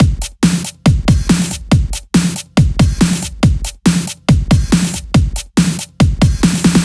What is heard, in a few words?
Breakbeat 140 BPM